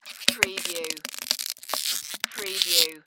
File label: Squeeze Plastic Bottle